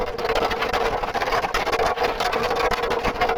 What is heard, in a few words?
SCRATCH RAMP; SIMUZENSOUND